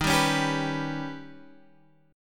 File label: D sharp Augmented 7th D sharp, Guitar, Standard; strummed; 11,10,11,12,12,x; JGuitar